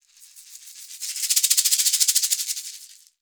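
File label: shaker, percussion; ILIO; Synclavier Percussion 2; World & Orchestral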